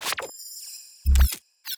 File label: point unlock